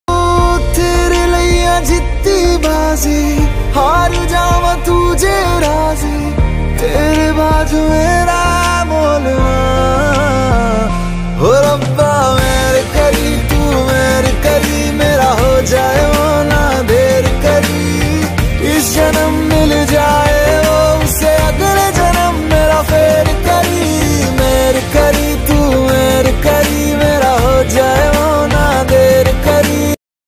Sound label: hindi ringtone